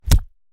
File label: Fight Impact; SoundsCrate